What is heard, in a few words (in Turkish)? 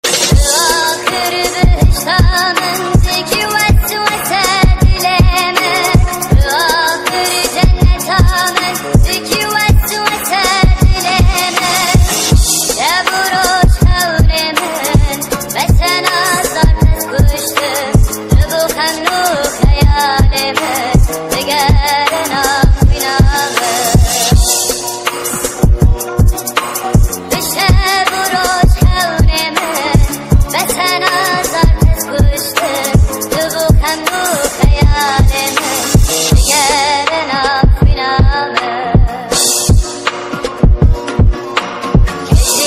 Kurtce Zil Sesi